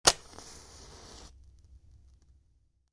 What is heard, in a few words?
Match, Strike; Flames; Flame Sound Effects; Flames (Sound Effects:Objects); Stuart Duffield